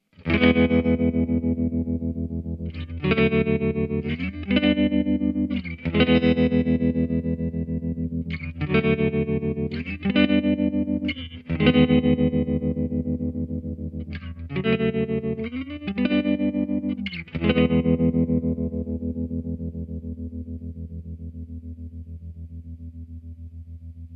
Asymmetrical Waveform - Just your basic asymmetrical tremolo.; empress